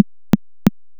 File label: pulsetrain